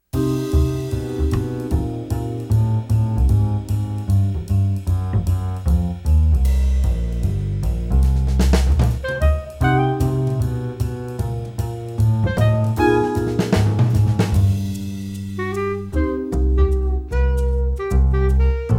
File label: walkbass